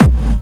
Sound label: Medium, kicks